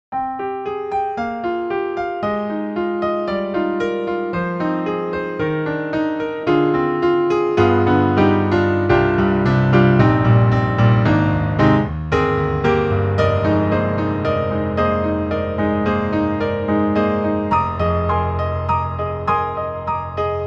C7 Grand (Close); Acousticsamples

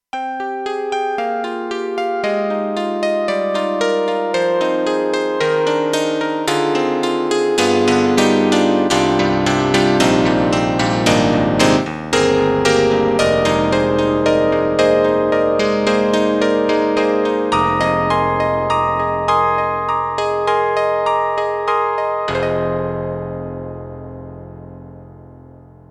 Piano; Roland; SC-8850

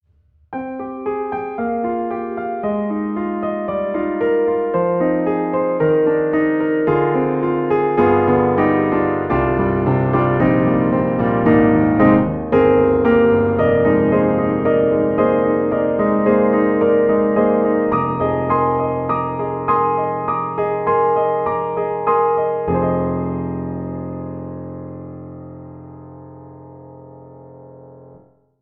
Pianoteq D4 Vintage, piano; MODARTT S.A.S.

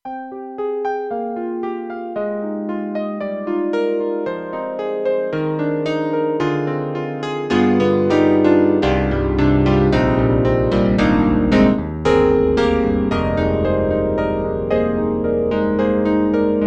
Electric Grand; KORG M3 XPanded Program

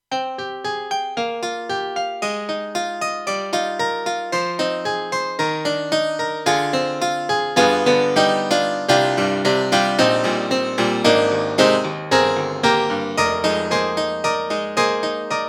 AKAI SG01k Pf

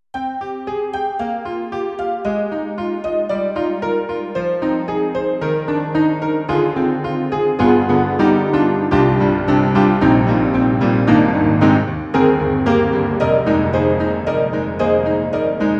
EDIROL SD-90, Contemporary, Old Honky

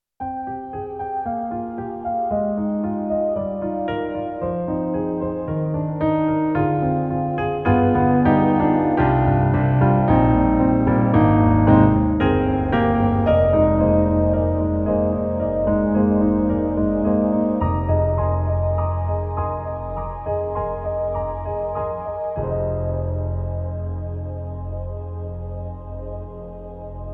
Warm Vox Piano; SD-80; EDIROL

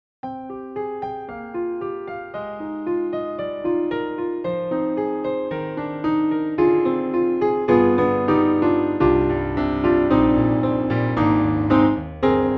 Warm Dynamic Grand; Italian Grand; Synthogy; Ivory II; Warm Dynamic 10 Grand

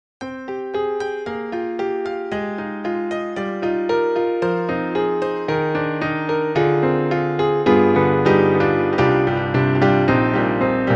Dancefloor Piano; Steinberg

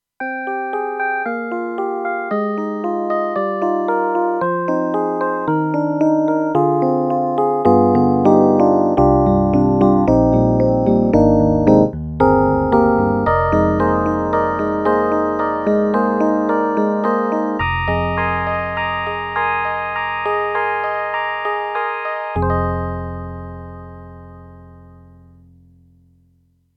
CASIO GZ-70SP ELEC PIANO

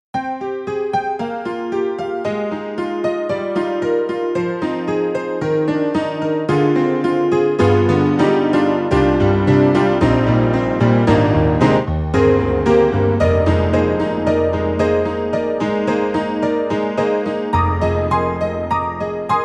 Piano; KORG; KRONOS 2

